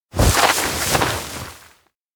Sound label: forest search; koyomi; anemoi